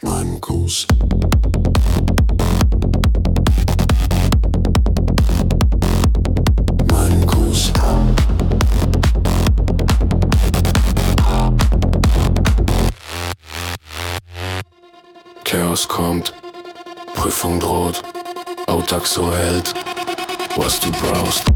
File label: techno song